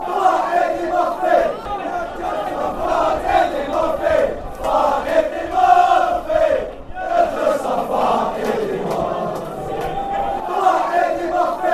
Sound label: fan chants